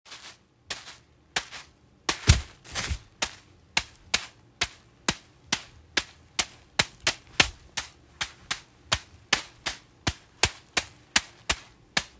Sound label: Hacky Sack